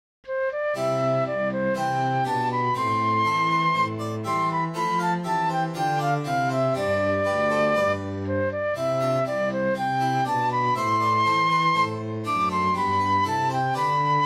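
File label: Gavotte for Flute with Harpsichord; Handel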